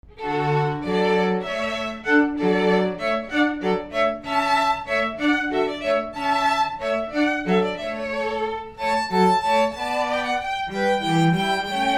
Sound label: alla hornpipe; handel